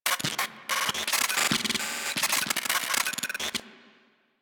Glitch Remove Helmet; Apostasy Cinematic

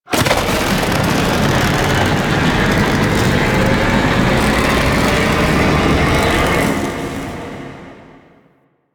Void Dragon Orb Charge; Enemies; Abilities; Warframe; Duviri

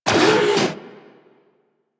Research Facil Repair Robot Rotate; Warframe; Ambience; Venus Open World; Objective Rooms; Props One Offs

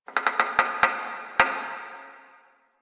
Metal Creak Small; Ambience; Warframe; The New War; Quests; Zariman

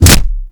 Generic Ability Impact; DE; Warframe; Warframes

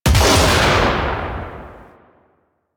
Finisher Shotgun Shot; Symbilyst; Warframe; Quests; The New War; Kahl; Weapons

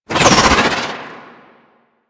Archgun Projectile By; Warframe; Archwing

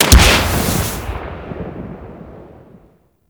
Grenade Launcher Explosion; Grineer

